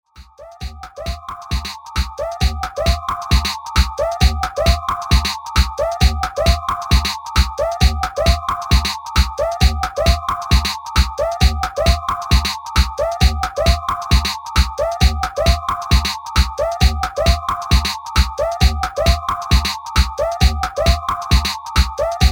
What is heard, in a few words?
Loop; SOMETHING VINYL SERIES